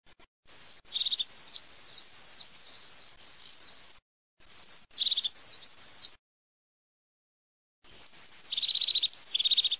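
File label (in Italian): CINCIA DAL CIUFFO, birdsound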